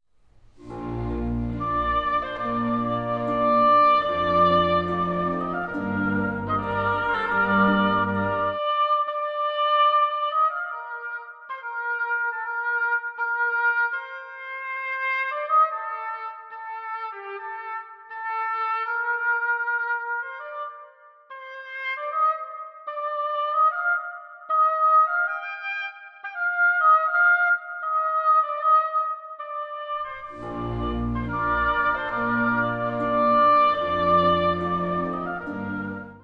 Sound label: Oboe